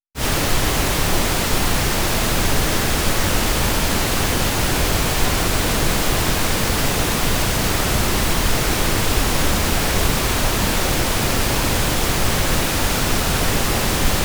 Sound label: pink noise